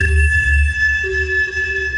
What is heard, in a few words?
sonar Ping Water Far Shuttle Directional; Barotrauma; Real Sonar